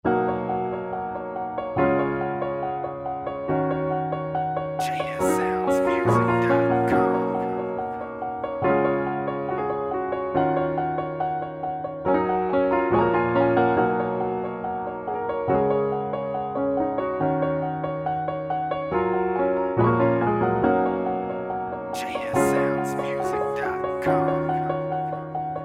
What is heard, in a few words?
Piano Vibe